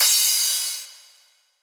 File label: Crash; Vengeance Samples; VES1 Cymbals